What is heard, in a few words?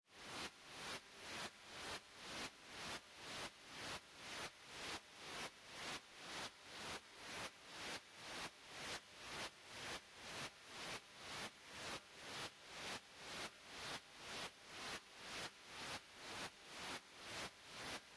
NOISE; rain world